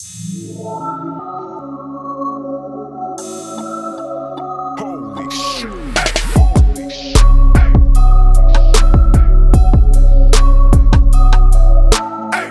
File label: Beat, roland TR8s